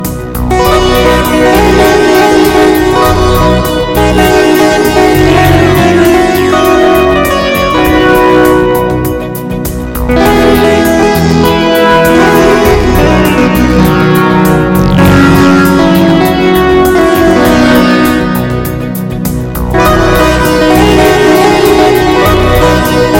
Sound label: Lovely Sounds